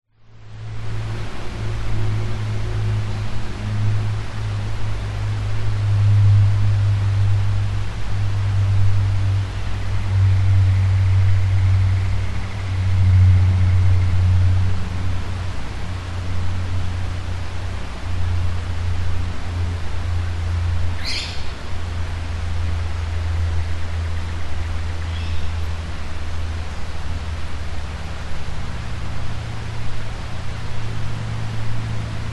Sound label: forest ambience distant river distant planes birds; nature